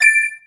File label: extralife; 祖玛